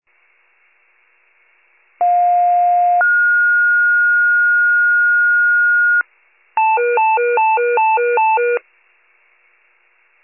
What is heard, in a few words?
FIRE pager alert